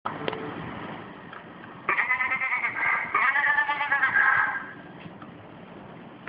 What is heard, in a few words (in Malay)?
bunyi kambing